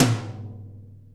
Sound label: Toms